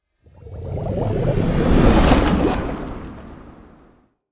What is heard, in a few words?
Cinematic water transition